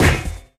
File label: physics turret impact sm